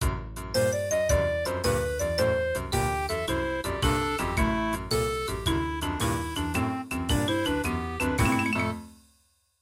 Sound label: MIDI Ending